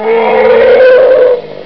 Indian Elephant

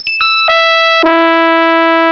trumpet

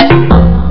bongo; Princess

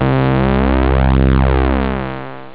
String; SynthSamples2

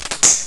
voices; Instruments

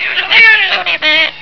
Daft parrot

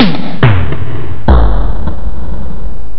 tomdrum; Instruments

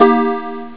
Chime Maj 6th; AMOS MUSIC 1

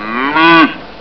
cow

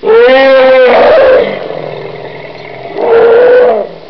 Indian Elephant

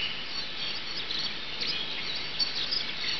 Bird Atmosphere

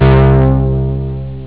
Bass; SynthSamples2

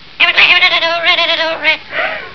Another Parrot classic

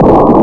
fire, Asteroids; Games Galore 3

